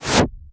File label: IMP WHOOSH